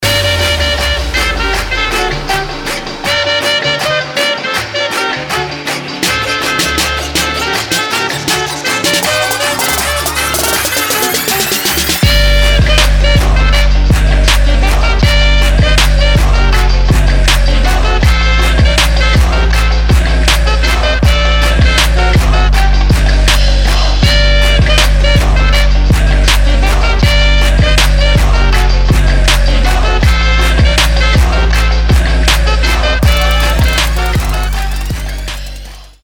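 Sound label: cheerful trap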